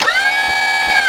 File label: bfg toggle, weapons; 48khz; quake2; rampage